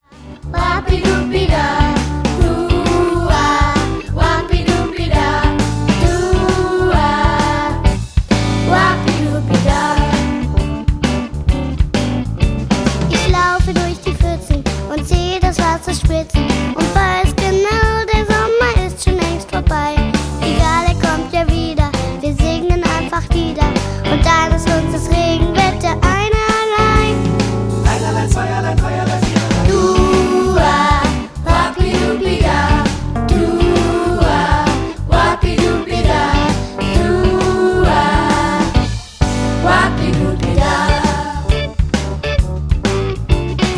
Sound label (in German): Regenwetter blues